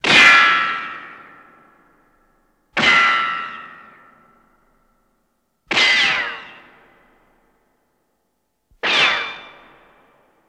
Bullet Ricochet X4

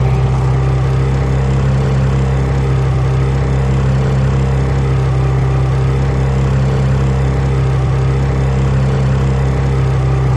50 hp Evinrude Boat Drive Loop Driving, On Board

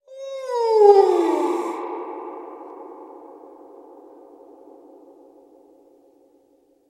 Ghost Cry Supernatural; ghosts & demons

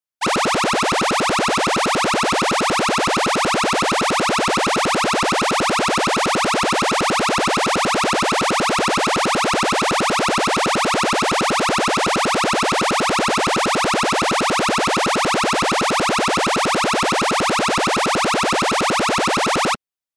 Laser 2 Long Oscillat

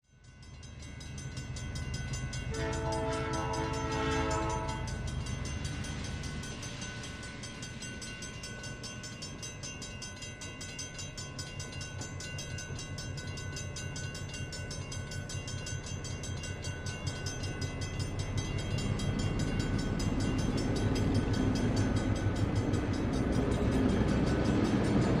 Diesel Train Slow By

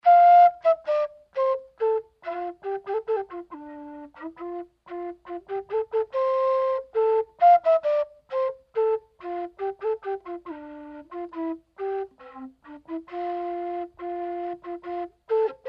Penny Whistle, clarke penny whistle; plays; slow

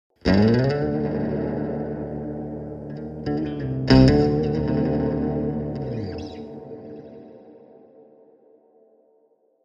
Electric Guitar Low Tone

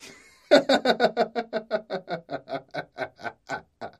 Laughter Male Chuckl

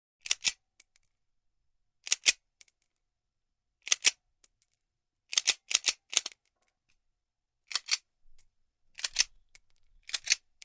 9mm Baretta Pistol, foley gun movement

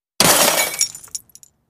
Glass Impacts Debris; windows & mirrors

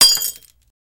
Glass Crash Drop Mar

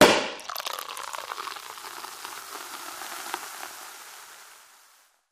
Cork Pop Fizz, eating & drinking

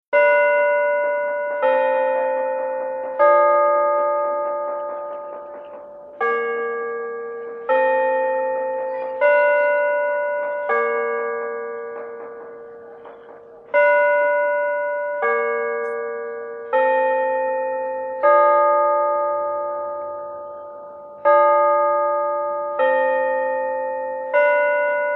Zoo Clock, bell towers exterior; Cent Park

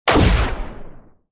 Gun Shot Lrg Hvy Sho, shots

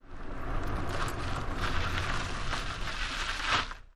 Car Tires Gritty Ski, tires on dirt

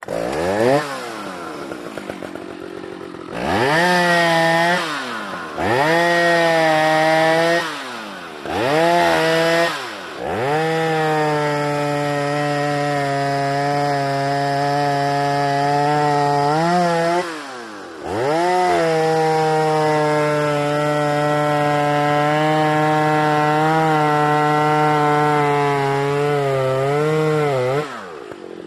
Tools Chain Saw Short

